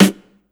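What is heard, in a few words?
snare drum right; USB Soundscan vol.31; Miro s Grooves; AKAI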